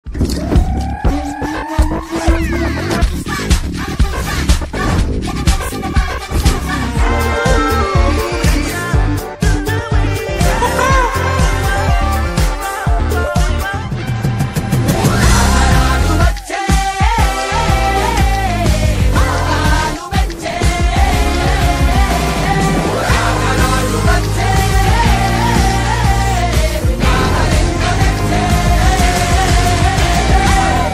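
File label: background music telugu; raja raja chora; sree vishnu; sunainaa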